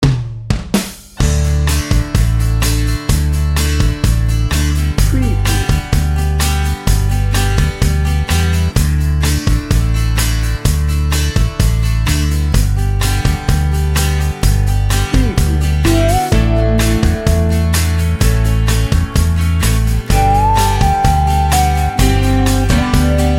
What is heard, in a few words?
country friendly